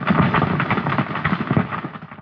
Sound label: rubble; hl2; roof top